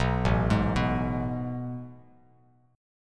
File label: A Major 7th Suspended 2nd, Bass Standard; AM7sus2 arpeggiated; 7,0,6,4; JGuitar